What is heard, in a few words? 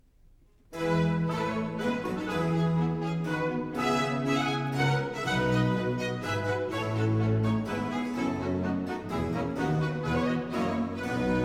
Hornpipe; 2nd Music First Hornpipe; Sir John Eliot Gardiner, Monteverdi Choir, English Baroque Soloists; Semi-opera Z. 630, 'The Indian Queen'